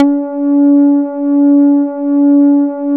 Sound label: SYN ANA, Analog; Sound & Vision - Gigapack I CD 2 (Roland)